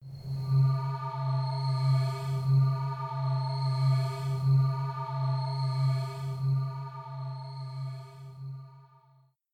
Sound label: Dark Scary Castle Ghastly Dining Room